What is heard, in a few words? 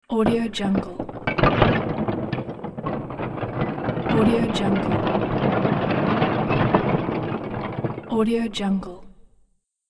building wall collapse debris